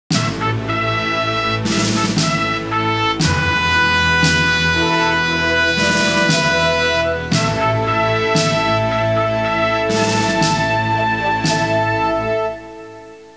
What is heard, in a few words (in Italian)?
musica sinfonica; symphonic